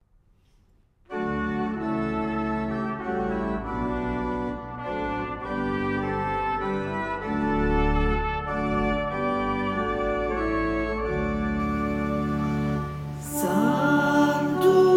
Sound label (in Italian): Sanctus soprani e tenori; Sanctus di Lourdes 2019